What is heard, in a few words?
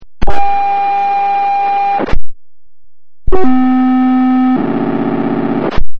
POCSAG